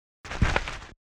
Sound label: Rolling